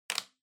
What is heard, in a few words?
Calculator Switch